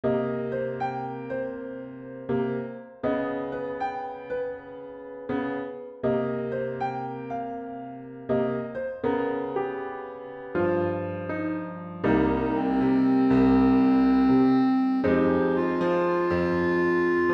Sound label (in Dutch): piano en klarinet